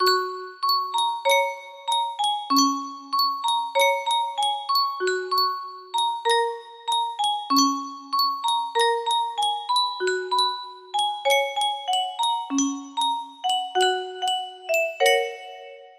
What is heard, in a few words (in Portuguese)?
Yunsheng caixa de musica